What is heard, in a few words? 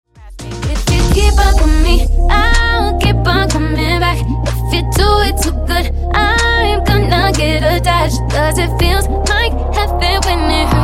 Funk House 125Bpm